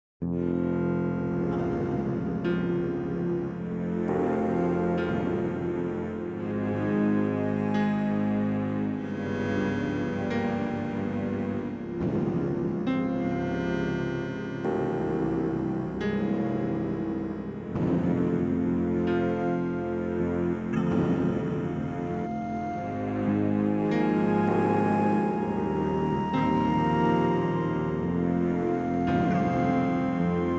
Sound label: Short stringed BGM Tune that never resolves itself but goes around in circl; ship hole in wall